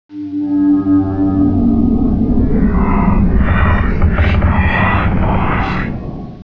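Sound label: cavern ambience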